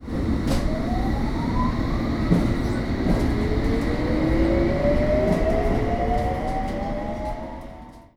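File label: s stock, train; kukensius; simutrans; Pak128.Britain-Ex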